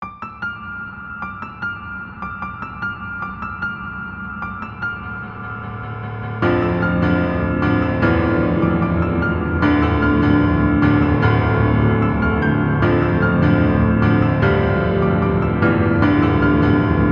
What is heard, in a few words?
Piano Cover